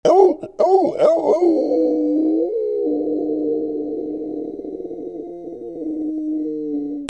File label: CARTOON